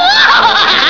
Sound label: wahaha, cartoons